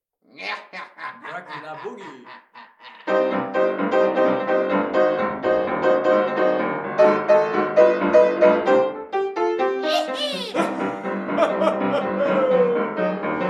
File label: dracula boogie, piano boogie; premiers enregistrements